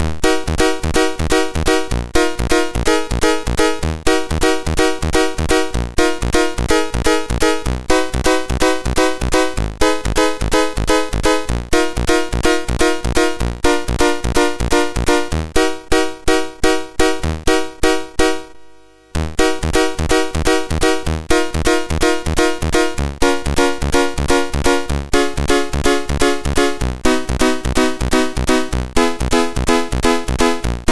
Piano Work, MOS6581R2; hvsc

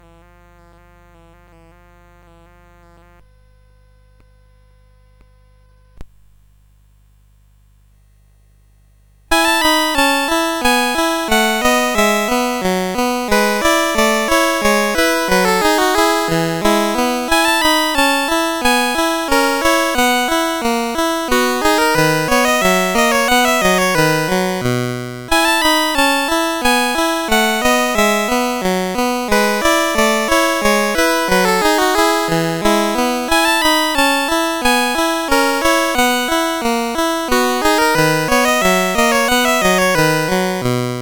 Polonaise, CSG8580R5; Joseph A Grau; Sixth French Suite

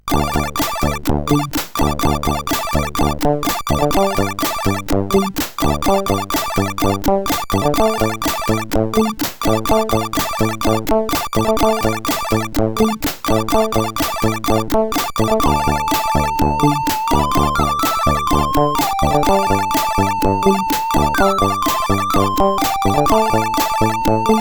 sid CSG8580R5; Hires Demo Kit part 2

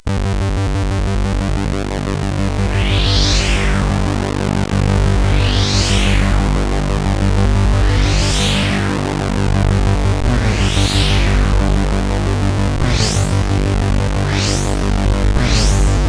Intro sid CSG8580R5; Protovision

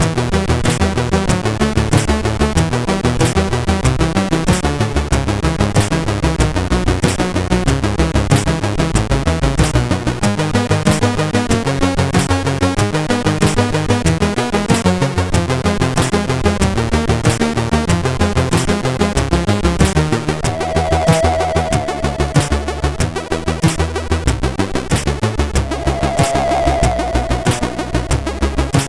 Metal Warrior 2, CSG8580R5

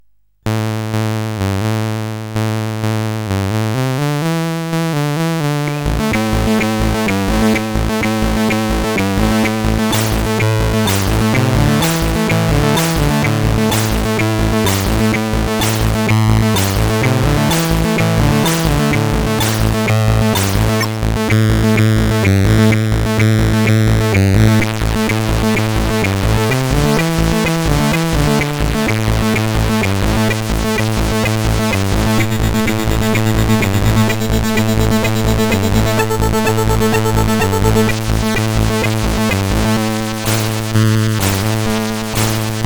Savage Noise, sid CSG8580R5

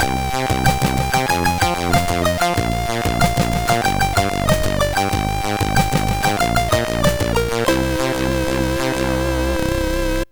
A Game Over Tune, MOS6581R3

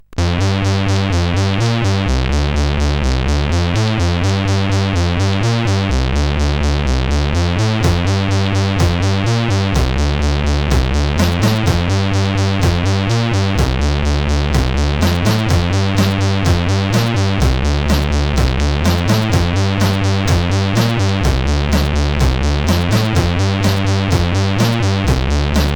sid, CSG8580R5

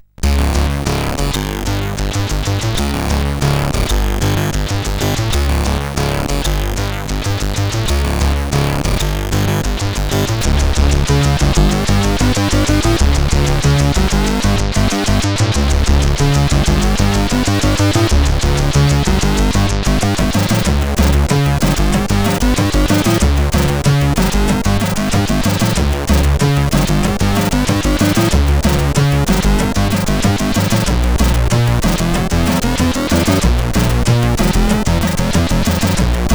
Cracktro, CSG8580R5; sid

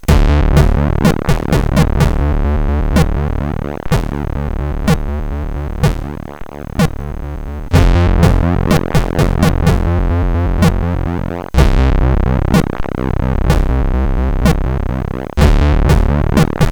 Test Tune, CSG8580R5

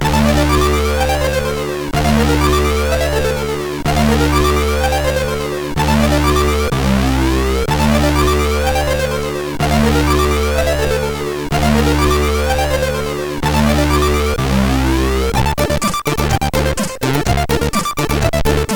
New Idea, MOS6581R3

